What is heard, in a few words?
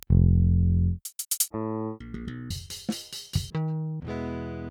Pultec; Virtual Analog